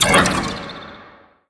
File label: impact, physics, vault